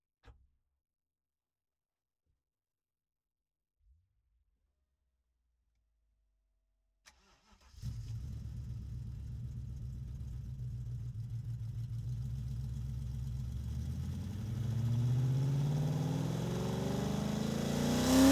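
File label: Pantera BMW onboard startup various driving shutdown engine back, De Tomaso Pantera 1972 with BMW Alpina V8; Pole Position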